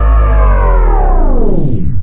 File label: VFX2 Sub